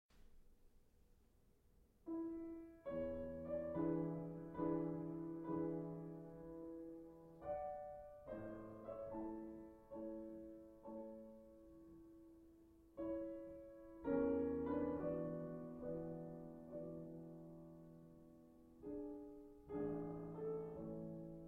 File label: Piano; Chopin Prelude Op 28 No 7